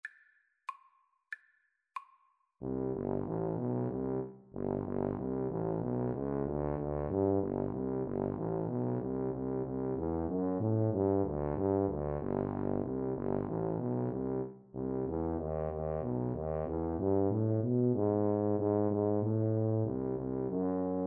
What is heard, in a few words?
Tuba Duet